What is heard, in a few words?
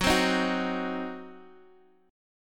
C Diminished F sharp